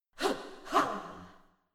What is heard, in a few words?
Princess Evade Forward VOX